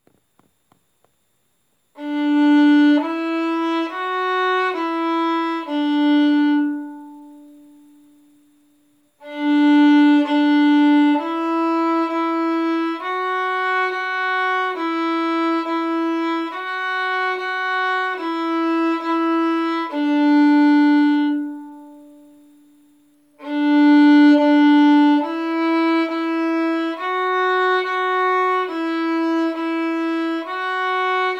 Bratsche arco

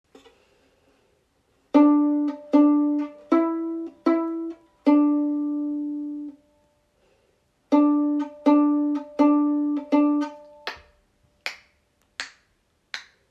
Bratsche pizzicato

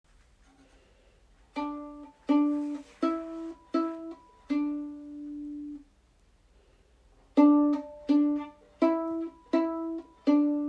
Geige pizzicato

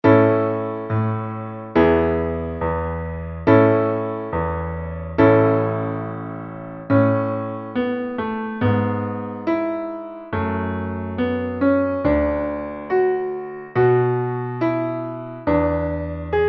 langsam Klavier

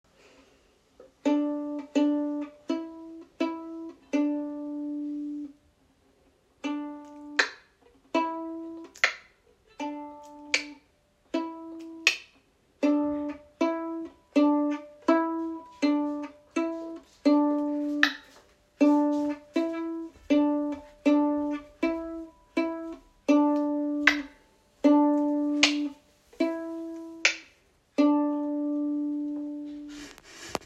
Bratsche pizzicato

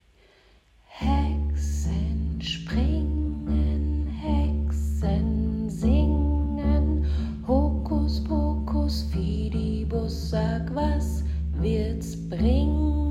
Hexen springen pizzicato Bass; gezupft

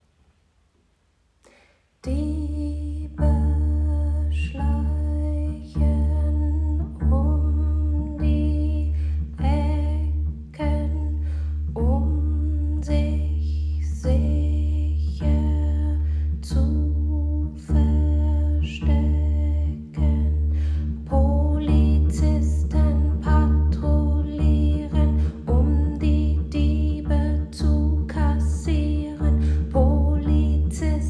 pizzicato Tempo 103 Bass; gezupft

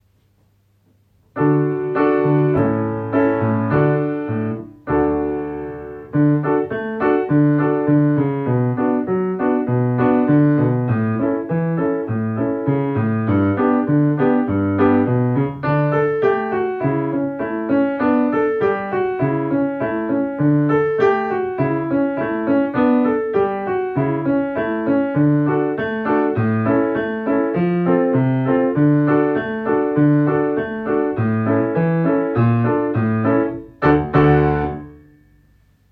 Alle Mann an Bord, Klavier schnell

